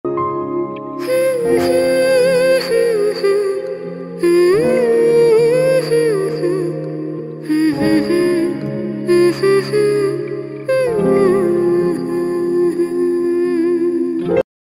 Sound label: ringtone background music